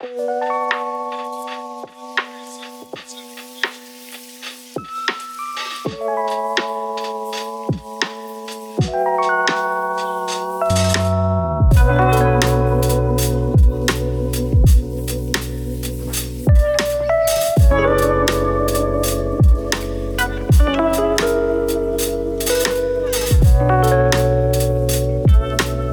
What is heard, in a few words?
Rustling Leaves